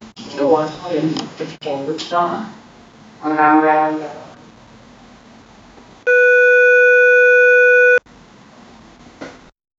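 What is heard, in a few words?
sound for checking if speakers, test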